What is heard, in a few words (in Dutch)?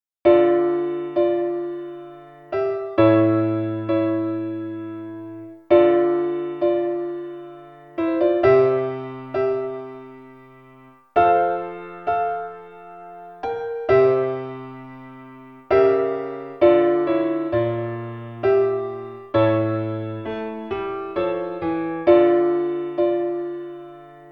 Folia C instr midiversie langz